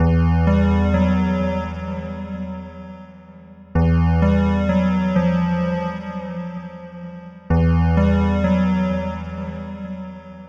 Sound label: Music Dungeon Caves; Dungeon League